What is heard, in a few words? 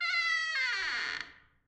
creek